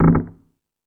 FX Sounds, Drum Machines

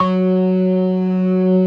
organ synth

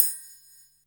DR-660 Perc, Drums, Boss DR-660

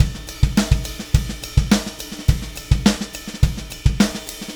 Clinical Beat, Indie Rock; Sonic Foundry (Sony Creative Software); Indie Rock Anthems; Session 02 - Clinical

